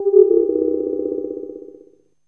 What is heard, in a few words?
ANALOG BELL; Zero-G; Ambient 1